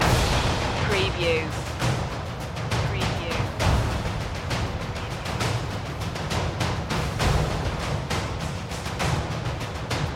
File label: perc loop